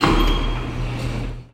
elevator door

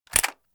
assault rifle reload

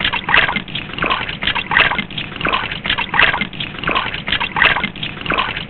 WATER, splash

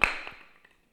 wood drop

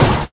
hit vehicle; car hit

